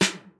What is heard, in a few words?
snare, snare house